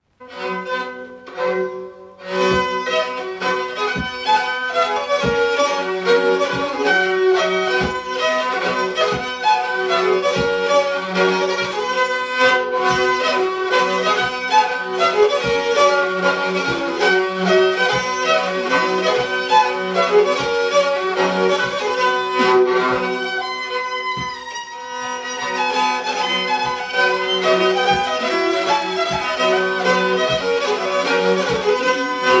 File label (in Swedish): Nyckelharpan